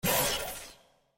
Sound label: Ice; Qiyana